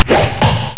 Arrow Hit

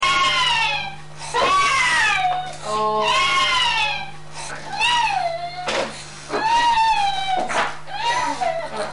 baby cry boy

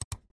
mouse click

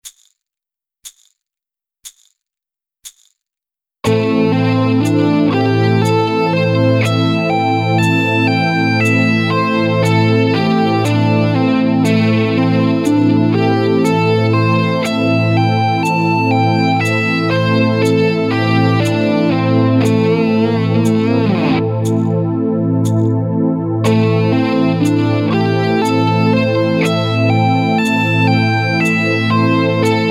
LEGATO

